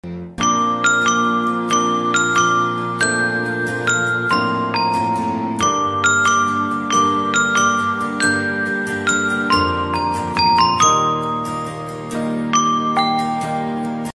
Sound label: phone call ringtone bgm ringtone mobile ringtone incoming call ringtone